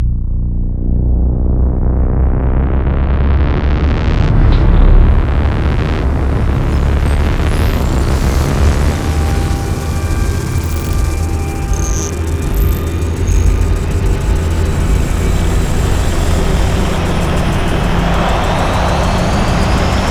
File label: skill screen attack cast